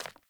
Sandals Run Default, Footsteps; Characters; Player

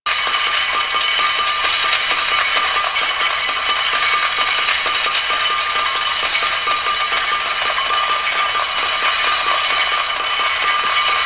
Metallic Spinning Gear Loop; Ambience; Props; Warframe; Entrati

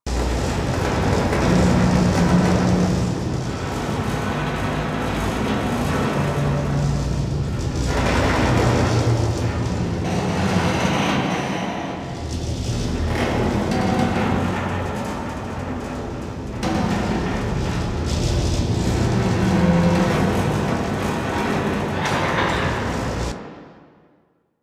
Void Storm Ship Rattle Loop; Warframe; Railjack

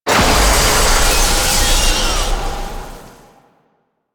Orb Path, Medusa Puzzle; Duviri

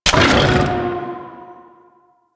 Sniper Mech, Weapons; DE; Warframe; Tenno; Quad Sniper

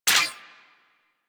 Sniper Mech; Sentient; Crp Sent Amlg Sniper

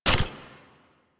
Jet Turbine Pistol Mech; Warframe; Tenno

